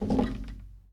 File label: Foosball Center Spin